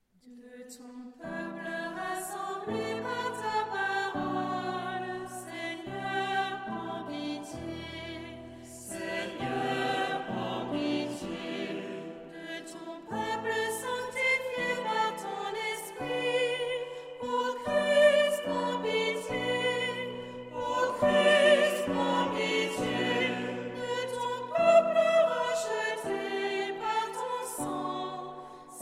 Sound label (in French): Kyrie complet